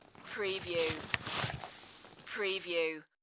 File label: Foley, Leather Texture Slide